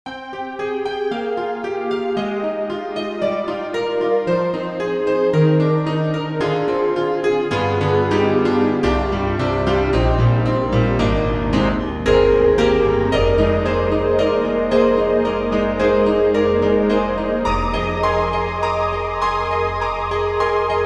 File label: Piano And Pad